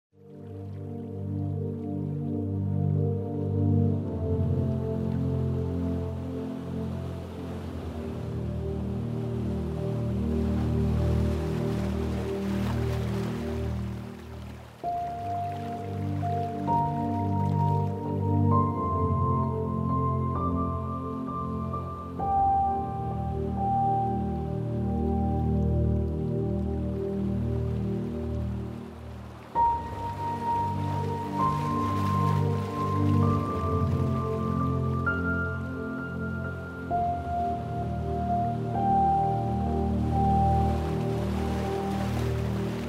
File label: Baby Lullaby Piano and Ocean Waves